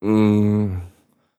Moan, MVoice; animaddons-voicepatch

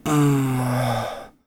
Grunt, MVoice

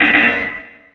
buzzer; notsanequarium

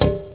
tap glass; notsanequarium

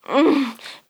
Moan Short, FVoice; rimworld animations

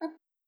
boop, F#

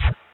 drop food; notsanequarium

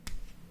Clap; rimworld-animations

